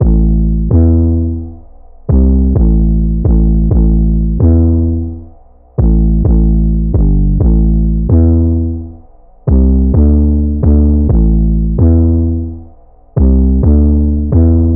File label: 808 loop 130 Am; Slate Digital BASSHOLE Sample Pack